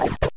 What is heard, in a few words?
turn; mancala